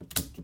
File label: switch; Barotrauma; EK modern